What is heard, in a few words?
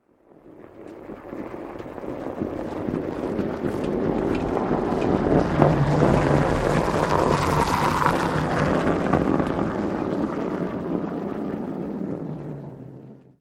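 car driving on snow wheels creaking slowly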